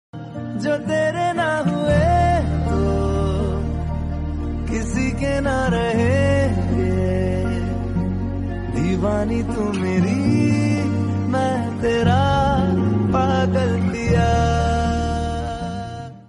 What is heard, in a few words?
love bollywood song tone ringtone